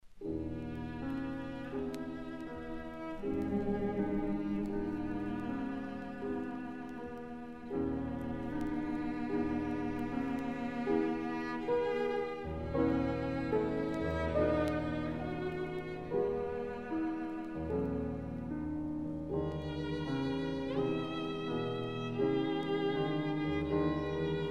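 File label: piano trio; fibich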